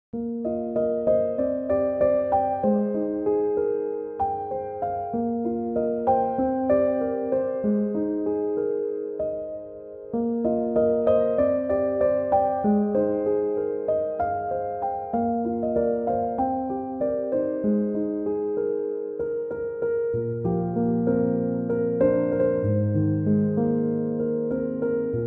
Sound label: piano instrumental